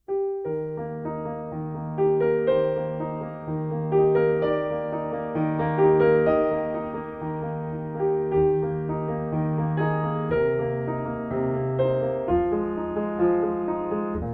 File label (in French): piano noel